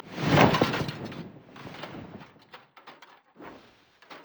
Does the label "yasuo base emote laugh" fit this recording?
Yes